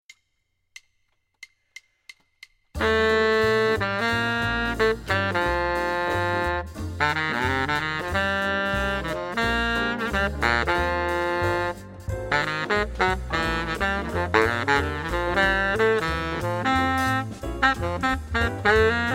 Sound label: bari sax swing